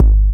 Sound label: Bass - 4B, Jungle; Blu Mar Ten; Jungle - 1989 to 1999